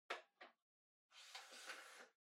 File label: Portable Stereo Far Antenna Extend